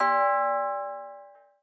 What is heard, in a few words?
eerie; Google; Google Sounds